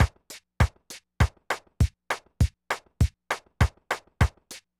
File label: Loop, drumtraks